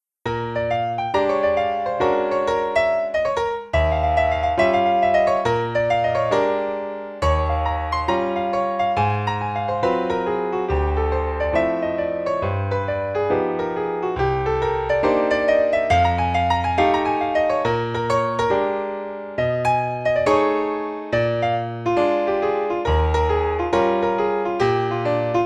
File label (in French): Arpege's blues, Piano seul; Jazz